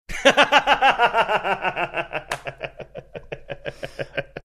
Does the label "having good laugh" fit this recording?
Yes